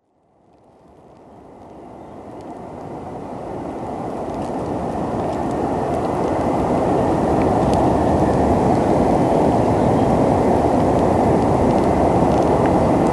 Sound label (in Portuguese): Fumarolas Furnas Enxofre Terceira Acores